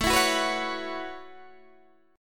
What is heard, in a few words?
A 6th Add 9th A down Guitar DADGAD